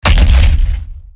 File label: Impact Swt; Evolve; Meteor Goliath